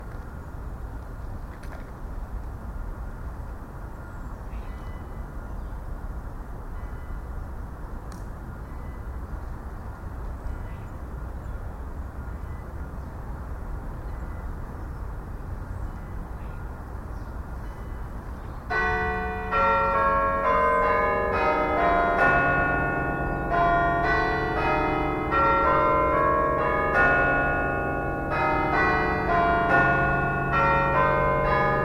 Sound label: lancaster parish church bells